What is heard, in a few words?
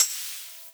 strings layer